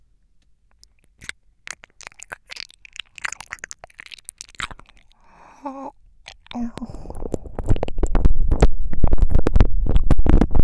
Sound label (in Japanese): バイノーラル黒耳耳舐め; 同人音声; ASMR・お手軽〜高額バイノーラルマイクの差を調査隊!!